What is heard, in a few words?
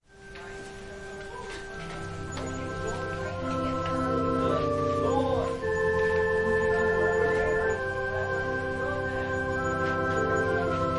Organ prelude